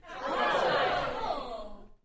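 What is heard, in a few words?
Crowd 15 Interior Rowdy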